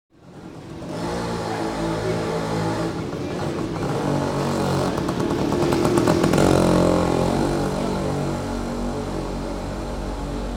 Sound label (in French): Ambiance ruelle méditerranéene; bruitage